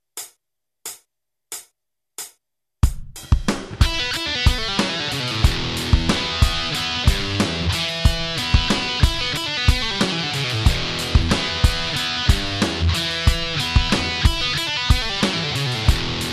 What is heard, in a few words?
slomo